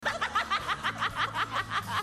laugh

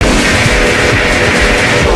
wheeeeel

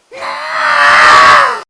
waaaah

